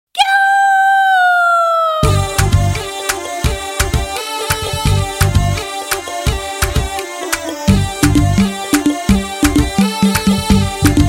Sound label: Beats